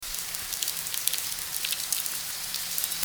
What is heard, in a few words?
rainfall